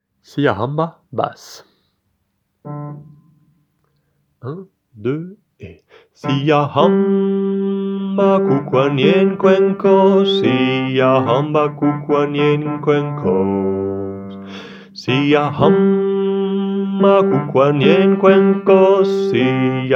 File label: Siyahamba basse; Folklore Zoulou